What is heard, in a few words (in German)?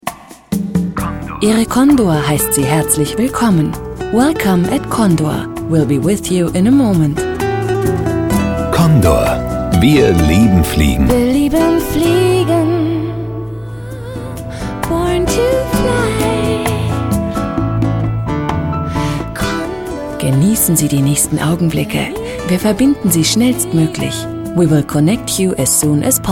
warte gesang; Condor